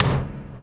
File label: DOWN, DOWN KIT 78